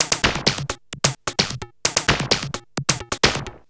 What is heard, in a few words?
Industrial Beats